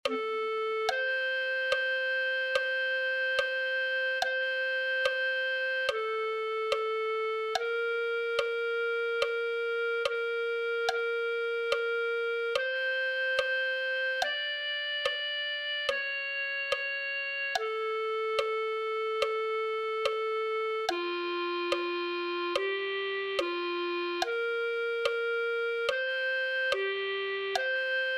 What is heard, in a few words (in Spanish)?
clarinete